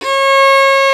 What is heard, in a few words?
fiddle; Violin 4 nv